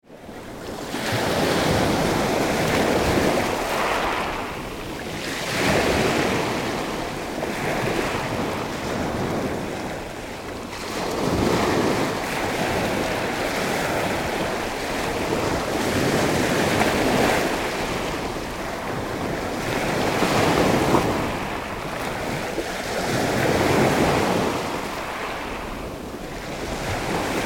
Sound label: Ocean Sea Medium Surf onto Pebble Beach; Close waves - not much pebble movement; Ocean Sound Effects